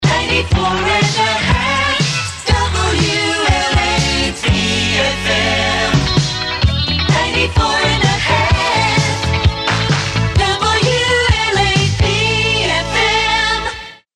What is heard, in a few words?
Jingles; WLAP-FM